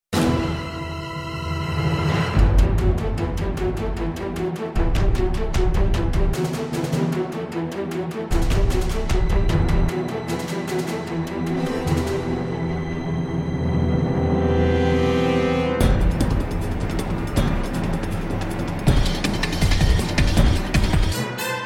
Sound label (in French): percu